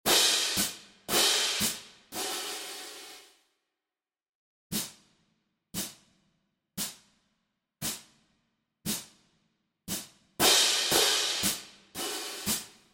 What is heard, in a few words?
bulldog cadence cymbals